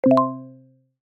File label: transfer success